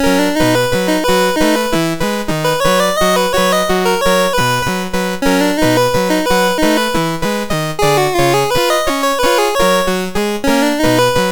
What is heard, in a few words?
sid MOS6581R2; C est la vie BASIC